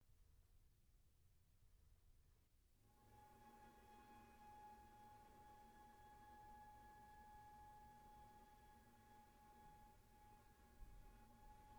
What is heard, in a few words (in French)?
Quatuor; Boulez; Livre Pour Quatuor Révisé